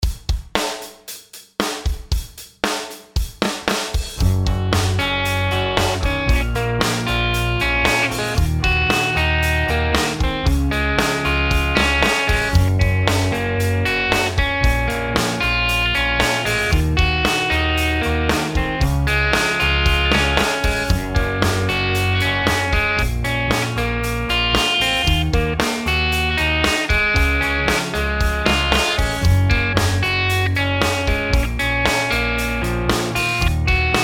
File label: chord progs maj root